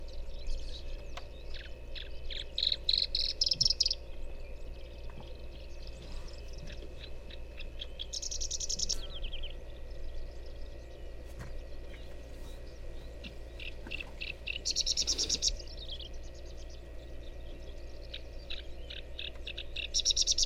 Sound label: Horsfield Bushlark